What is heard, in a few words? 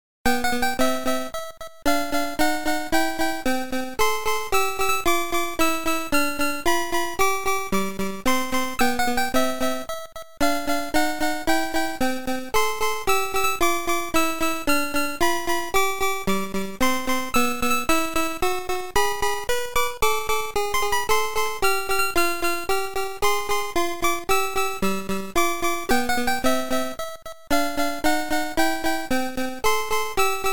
Ending; SMB3